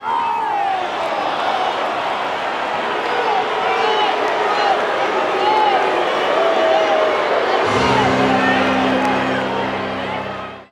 Baseball Crowd Reaction Boo; Crowds

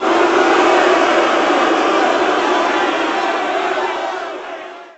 BMX Crowd Boo Medium; Crowds

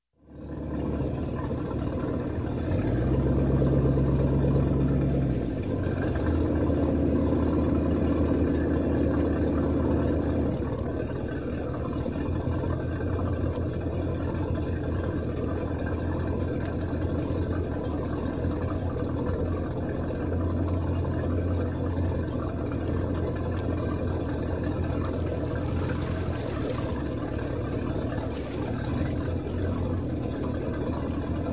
Boat Inboard - Pulling Up To Slip; Chris Craft; Shut Off; Sound Ideas; Series 2000

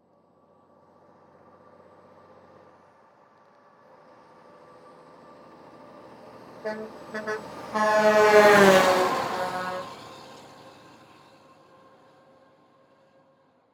Semi Truck Mac; Blastwave

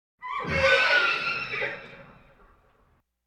Animal effects snorts grunts farm ambience; Valentino Production Sound Effects Library CD50

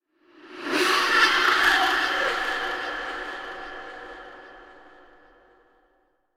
Female Laughter Eerie Echo; Hollywood Edge; sound designer's toolkit v2

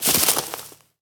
weed fall; firefight

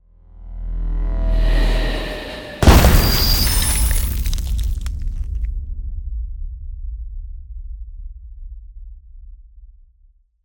Ice Spell Explosion Impact Glacier Slam; Ice Explosions & Impacts; Arctic Aura

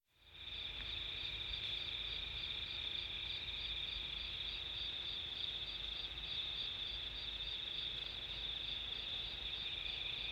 Birds in tropical room; KMP Film Video Sound Effects

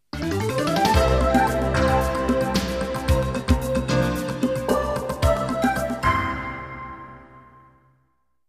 jingles; 90 DDD Jingles

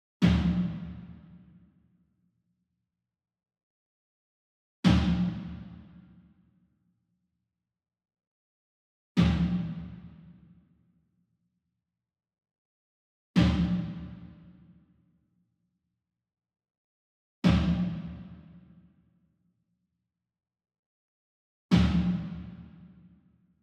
SINGLE DRUM Tom Concert Hit Soft Beater Far; boom library; Cinematic Strikes Construction Kit

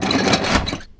Lever Metal Panels Open; Doors

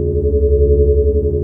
Scifi Mechanical Arm Loop; SFX Kit; Sci-Fi Loops

